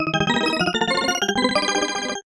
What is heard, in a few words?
Win Levels; brave soldier invasion of cyborgs; ps4; xbox series xs